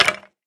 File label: skeleton hurt; mob; minecraft pe 0.6.1